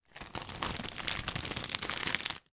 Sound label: office a4 plastic document wallet movement